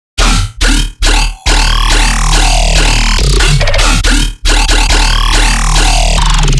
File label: Riddim Bass Loop D# 140; Bone Breaker; Ghosthack; Riddim Essentials Volume 2